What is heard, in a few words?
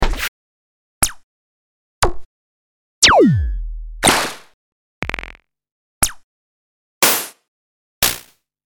Percussive